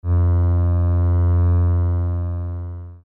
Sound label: cello cs2